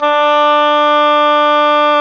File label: OBOE, mf f; Roland L-CDX-03 Disk 1; Oboe 2&3